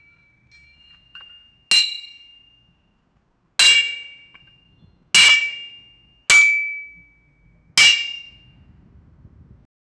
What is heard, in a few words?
heavy metallic thud; tackle